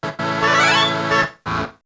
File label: event complete, race; Garry's Mod; racing tool